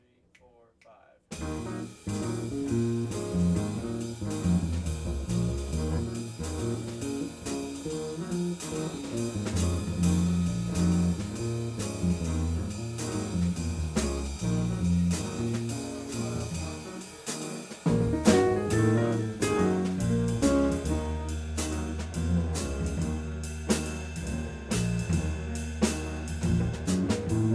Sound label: jazz